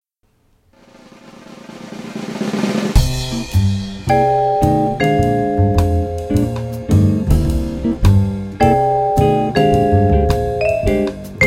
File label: jazz vibes; Jazz Vibraphone